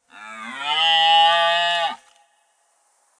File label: Deer call, nature sounds